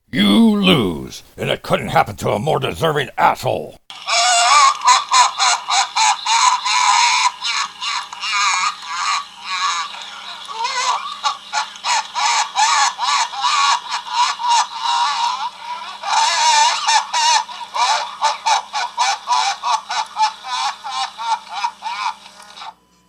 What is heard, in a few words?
you lose laugh